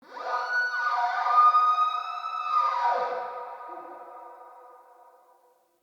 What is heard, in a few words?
Wetland Forest Day Creature Distant; Midrath Island; Ambience